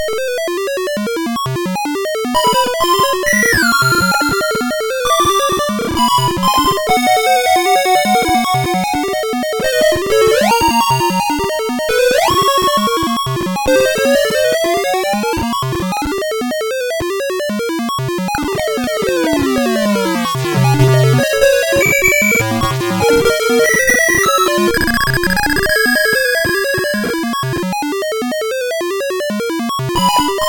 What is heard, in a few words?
Musicbox, sid MOS6581R4